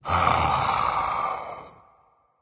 content, voice; Smite; ymir skin6

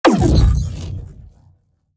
throw; smite; atlas

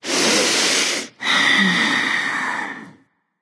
nv breath; smite; awilix

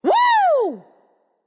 nv yay; smite; awilix

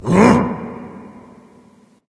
nv confused; Smite; awilix

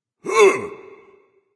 surprise; smite; Vulcan

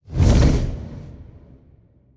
wing; smite; npc; grkconqs8 titan chaos; s8chaostitan

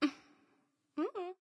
defeat; apollo; smite; apollo v4 skin14

